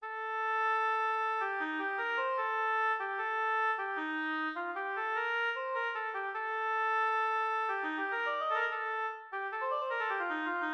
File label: solo oboe; Ferround